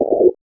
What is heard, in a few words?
Drums; Distored Reality